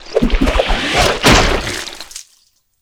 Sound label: dong